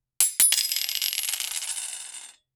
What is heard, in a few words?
the fall of a large, falling objects